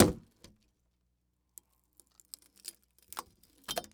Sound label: Weapon Sword Stab Thin Wood And Pulls Sword Out; AdobeCC